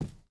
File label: footstep burlap wood run; Rust